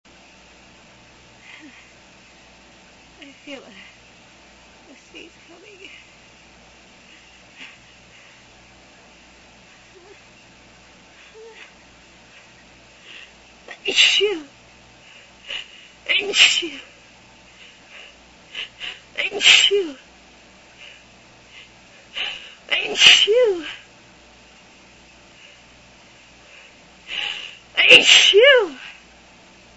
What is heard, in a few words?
small fit, female